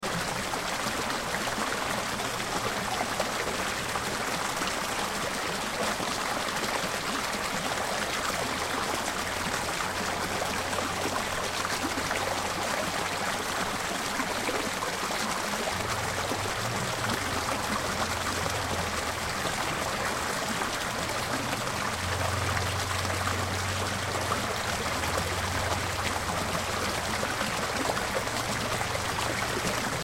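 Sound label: atmo arizona gurgling brook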